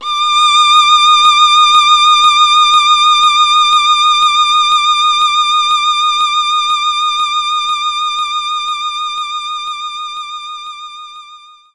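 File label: Violin D sharp 6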